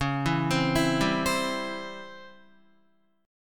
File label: Db Minor Major 7th Flat 5th Db Guitar, chord; DbmM7b5 arpeggiated; JGuitar